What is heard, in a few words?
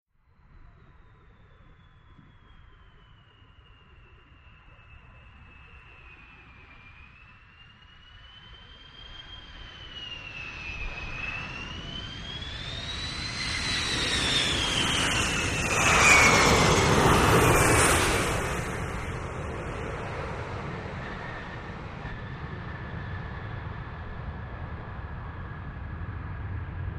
Lrg Jet Landing Busy